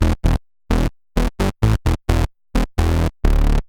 egaed, edm bass

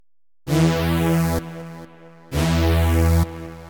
e slide chord

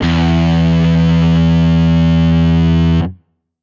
guitar electronic, distortion guitar

